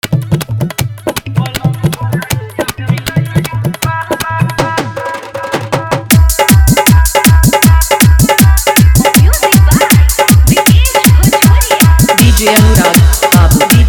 Full Dhollki Bass

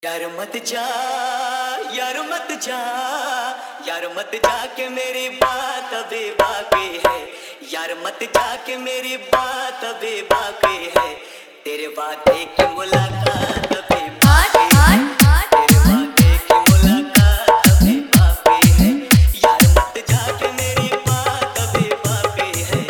Hard Dholllki Mix

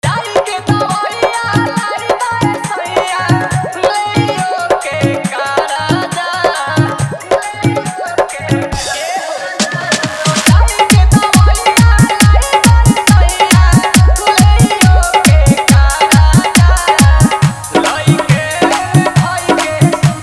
Hard Dhollki Bass Mix

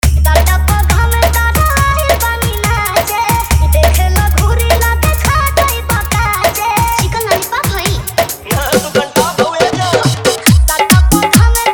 Bhojpuri Dj Song Hard Dhollki Bass

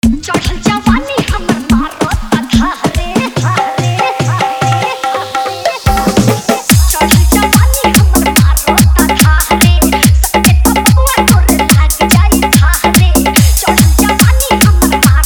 Dhollki Bass Mix

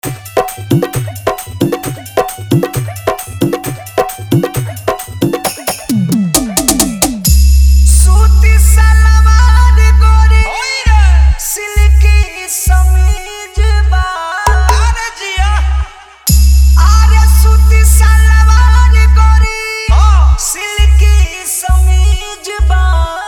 Hard Dhollki Bass Mix

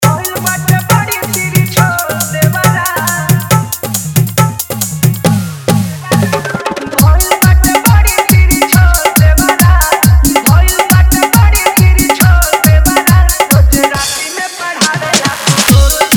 Hard Dhollki Bass Mix

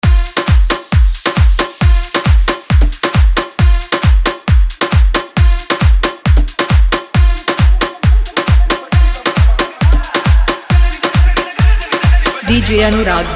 Shaadi Special Dance Edm Bass Remix

